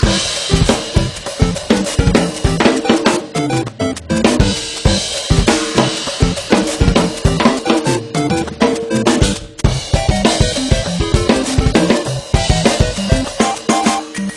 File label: drum Medley